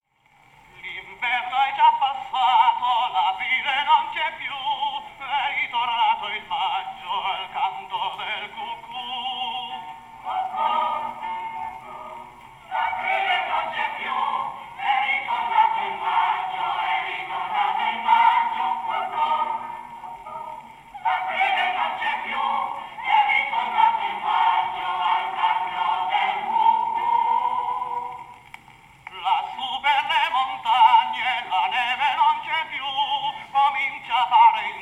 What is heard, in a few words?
cucu, grammophone mikiphone